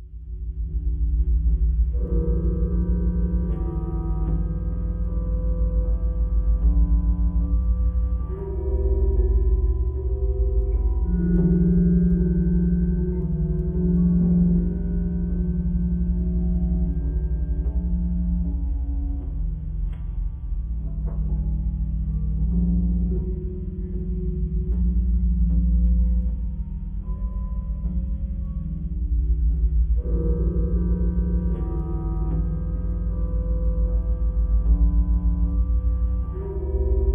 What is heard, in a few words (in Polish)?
podklad sala tortur mono; apocalipsis